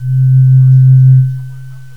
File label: whistle; GMPlay; Pipe